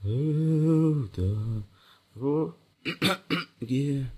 humming, stalker

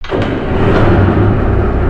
generator start; jupiter

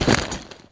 inv open, interface

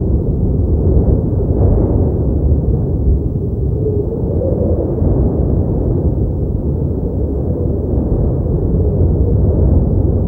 cold wind

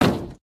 metal, human step

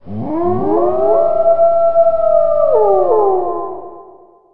wolf howl, outdoor ambient

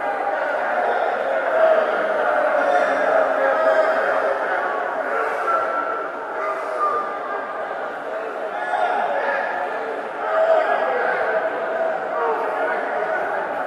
crowd, arena; ambient

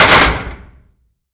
metal

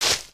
grass, human step

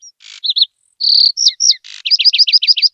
rnd bird, ambient outdoor

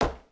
wood, human step

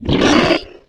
flesh pain, monsters

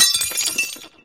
glass fall

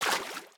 water, step

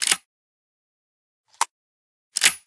toz34 reload one, weapons

